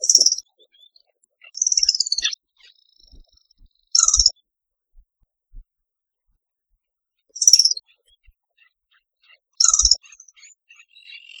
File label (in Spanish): canastero comun; Asthenes baeri